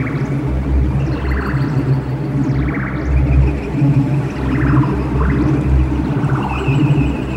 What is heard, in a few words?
ambience